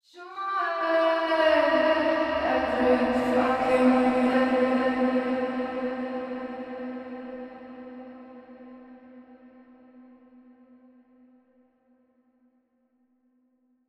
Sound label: 130bpm Am, Vocalpack